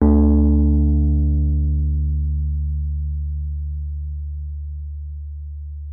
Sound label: Wurlitzer D2; Xsample VOL-1; 90 s Sample CDs; WURLITZER B